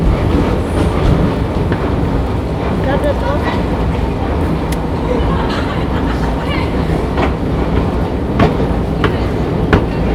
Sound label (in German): Geräusch